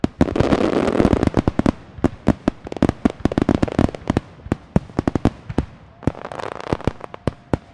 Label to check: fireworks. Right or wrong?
Right